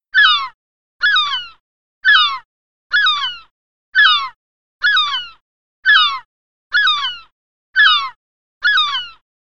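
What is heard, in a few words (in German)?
klingelton möwe möwenschrei ton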